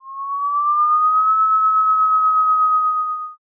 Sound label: kettle